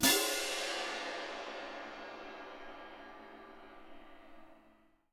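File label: CYM P right, cymbal, percussion; Miroslav Vitous - Percussion & Harp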